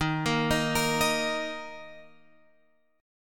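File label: E flat 13th E flat, down, Guitar, Open F; JGuitar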